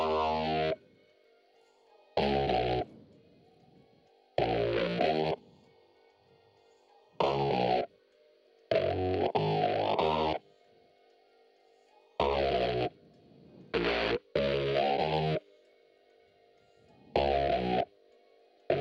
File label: Bass Bounce